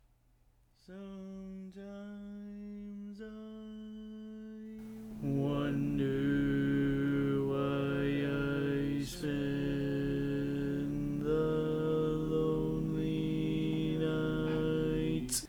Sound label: Bari, Barbershop